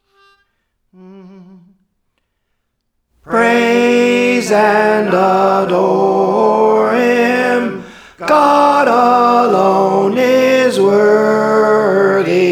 Tenor, Praise For The Lord Training

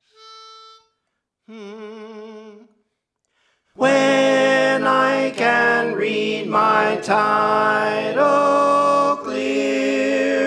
Tenor; Praise For The Lord Training

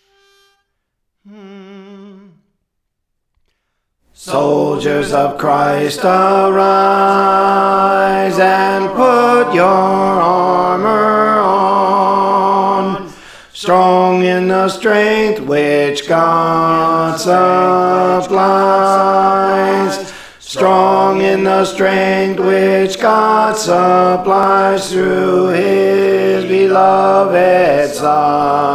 Alto, Praise For The Lord Training

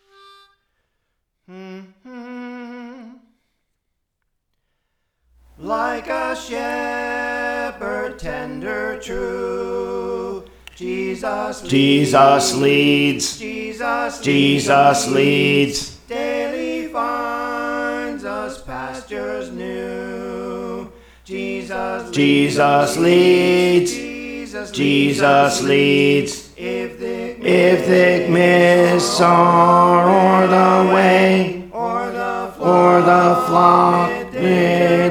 Alto; Praise For The Lord Training; Jesus Leads

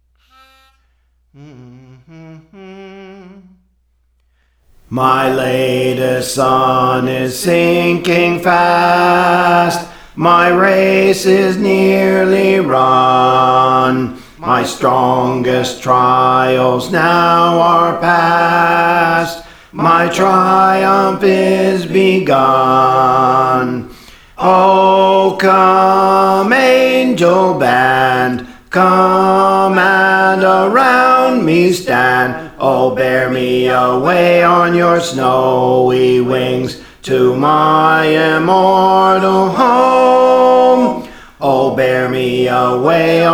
Bass; Praise For The Lord Training; My Latest Sun Is Sinking Fast

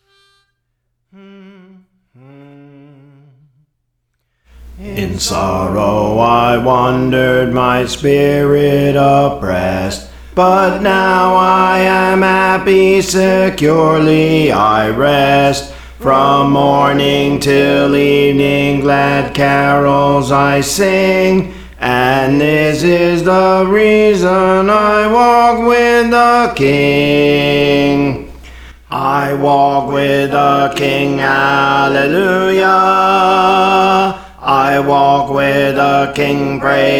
Bass; Praise For The Lord Training

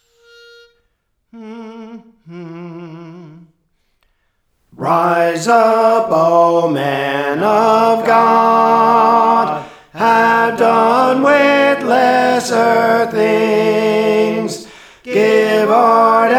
Tenor, Praise For The Lord Training

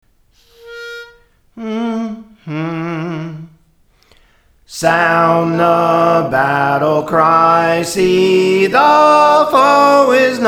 Soprano; Praise For The Lord Training

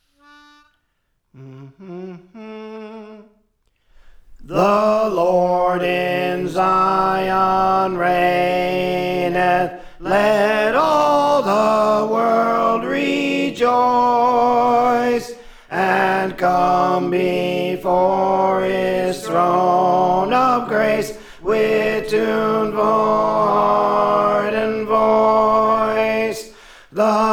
Tenor; The Lord in Zion Reigneth; Praise For The Lord Training

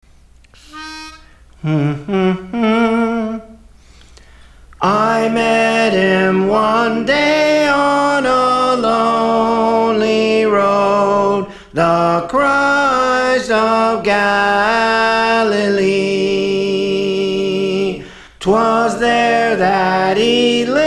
Soprano; Praise For The Lord Training

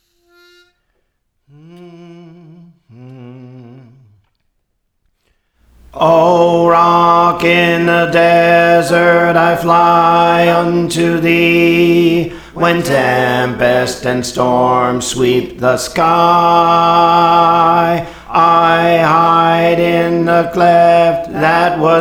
Bass, Praise For The Lord Training

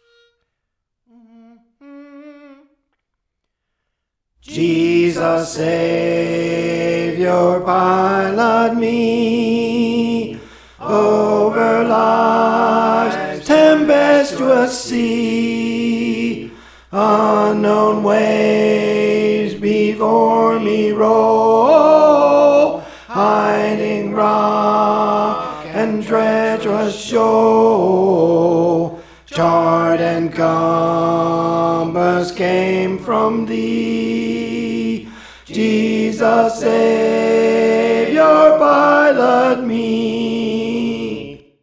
Tenor; Praise For The Lord Training; Jesus Savior Pilot Me

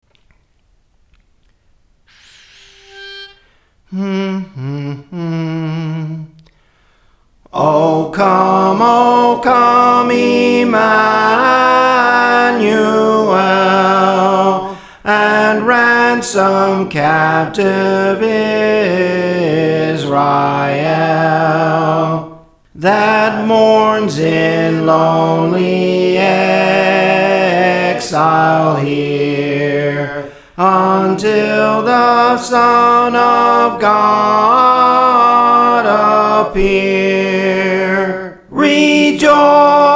Soprano, Songs; Praise For The Lord Training

